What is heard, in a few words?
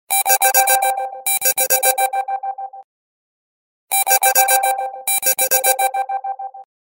Ring Ring